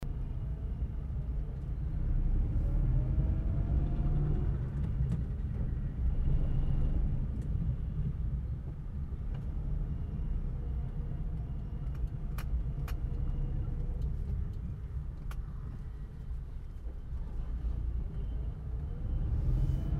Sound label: SOUND OF CAR RUNNING, INSIDE CAR AUDIO